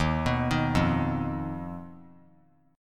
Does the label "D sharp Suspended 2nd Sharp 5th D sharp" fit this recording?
Yes